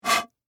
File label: Gun Barrel Blow Air; Warfare; Miscellaneous Combat Sound Effects